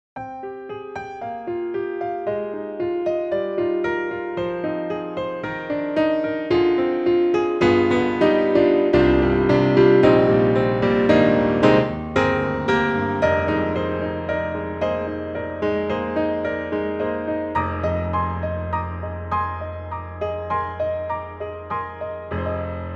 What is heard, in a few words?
Grand Pianos German D Concerto German D Grand; Synthogy; IvoryII